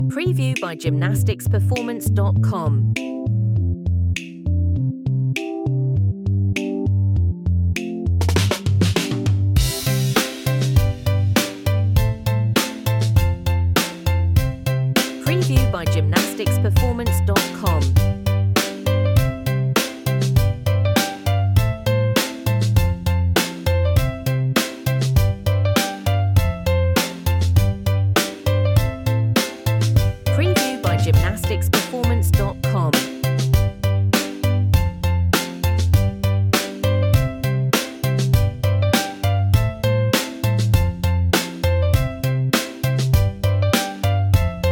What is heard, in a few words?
kid pop